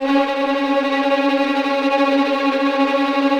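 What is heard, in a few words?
VI TRM F3C-L, Orchestra violin tremolo; AKAI Advance Orchestra Upgrade 97 Vol.1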